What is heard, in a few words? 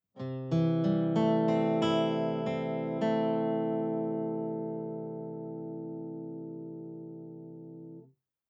open c, tunings